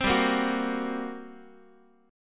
G Minor Major 7th Double Flat 5th, G, down, Guitar, 4A 4E 5A 5D 5G sharp 6C sharp; strummed; JGuitar